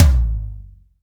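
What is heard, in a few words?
tom, drums; Best Service; Real Mega Drums VOL-1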